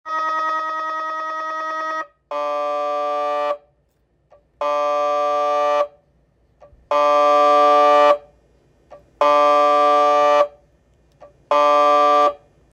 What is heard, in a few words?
MRI Structural